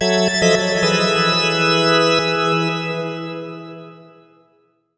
Organ; rusty s real deal baseball; nintendo 3ds

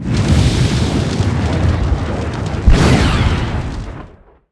Fly Tomahawk; pangya fantasy golf pc

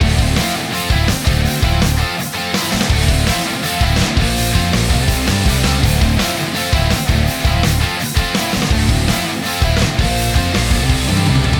Special Boss Loop; Spark the Electric Jester 2

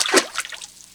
Footstep water light

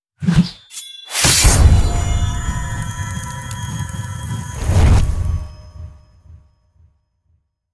area attack

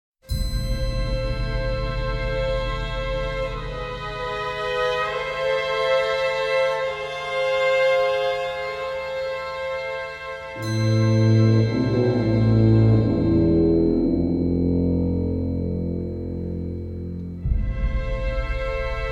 location spooky explore day

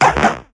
perc; Gonner

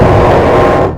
Bacteria Jumpscare; backrooms 2d android ios online windows gamerip

